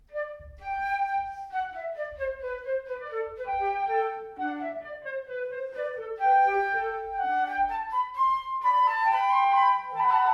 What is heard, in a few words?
Telemann Canon